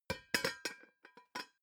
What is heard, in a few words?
pot lid drop close long; objekte; topf